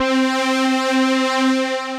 Essential Pad; Synth Pad; Ableton; Factory Packs; Synth Essentials

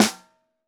SN1 Bottom, snare

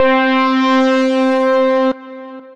Synth; Synth Lead

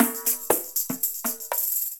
Tambourin, latin percussion; Instrument Kits; Ableton; Factory Packs

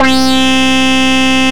Synth Lead; Bass

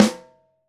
Snare Top

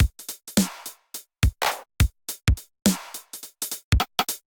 707 Kit groove 105 bpm; MIDI Clips; Cannibals; Ableton; Drum Essentials

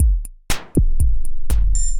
Arp2600, Drums; Ableton; DM ARP 2600 Drums by Flatpack

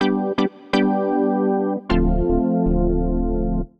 Organ (G) 126bpm; Music Loops; Plaza; Ableton; Singularities

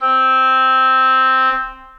Oboe Solo Legato, Orchestral Woodwinds; Ableton

